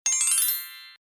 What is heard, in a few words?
win